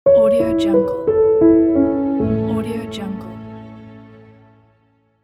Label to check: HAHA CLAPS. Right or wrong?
Wrong